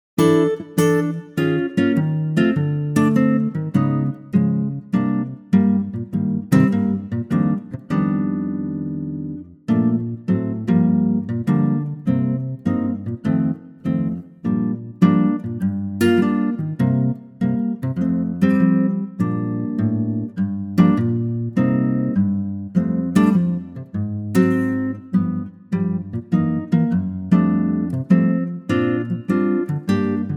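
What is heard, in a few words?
Bb acoustic guitar; key - Bb - vocal range - C to C; Superb acoustic guitar arrangement of the mexican classic from 1939 but in a gently swinging arrangement.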